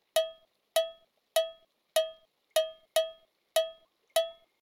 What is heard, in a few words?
Basic Cowbell Sound